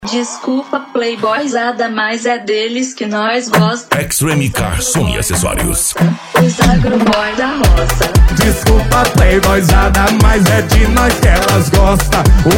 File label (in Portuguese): piseiro e sertanejo